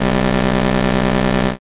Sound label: buzzer open